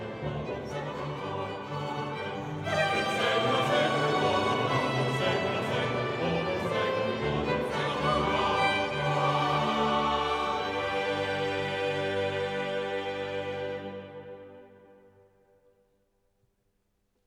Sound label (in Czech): koncert fragment